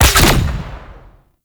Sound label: Grenade Shot; Warframe; Lasrian Soldiers; Weapons; Lasrian Chem Nox